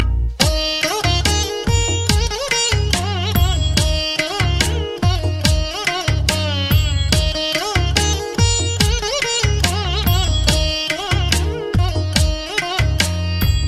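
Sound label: instrumental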